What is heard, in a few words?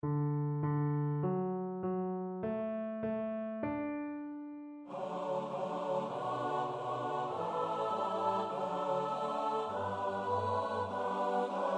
SATB; Climbing Up The Ladder